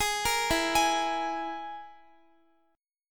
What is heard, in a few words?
E-Major Flat 5th E, Guitar; EMb5 arpeggiated